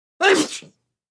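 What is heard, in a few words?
Male Short Sneeze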